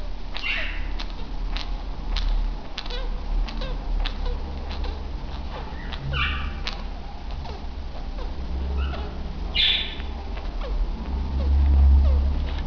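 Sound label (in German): knackendes atemgeraeusch, wellensittich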